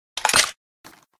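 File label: gp30 grenade load; Boomsticks and Sharpsticks; weapons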